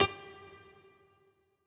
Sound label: piano riff intro